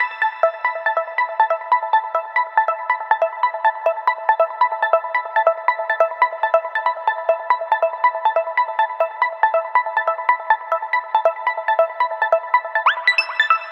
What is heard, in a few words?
Bpm140 Seq Loop GmajDmaj, Dubstep; Airy; Prime Loops; Dubstep Illusions